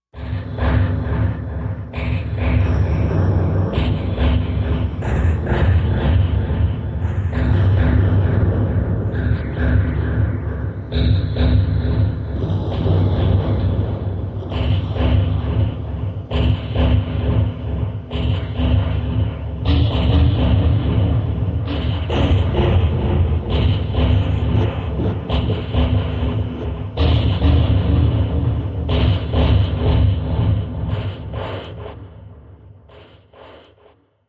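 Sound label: Damage Repeater Machine, horror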